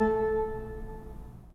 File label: Pipe Mix Celeste, 3D Organs; E-MU Producer Series Vol. 5; 3-D Audio Collection